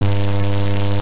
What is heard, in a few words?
electricity; old tv2